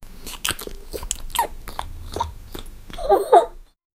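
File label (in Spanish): bebiendo de biberón, domestic sounds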